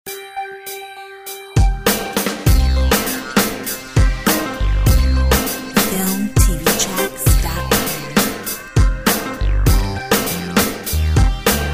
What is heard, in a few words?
Hip Hop